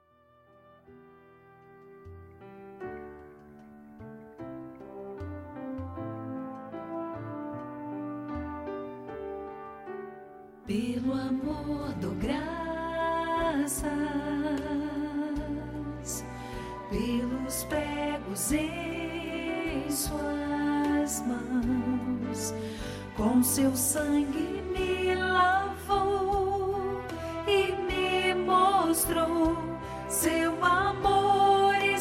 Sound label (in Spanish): Contraltos; cantata navidena